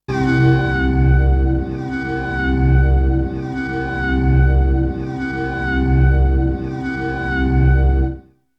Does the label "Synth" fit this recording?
Yes